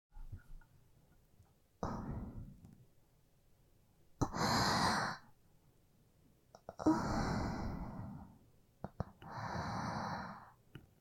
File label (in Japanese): オホ声